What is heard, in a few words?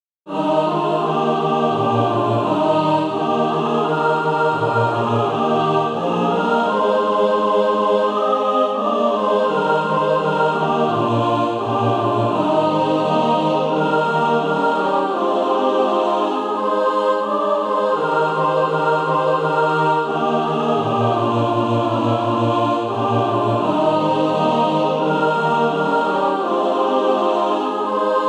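mixed, Practise Music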